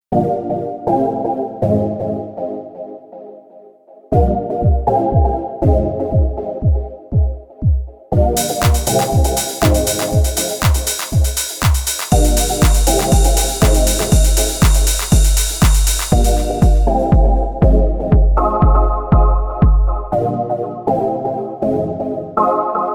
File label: House Drums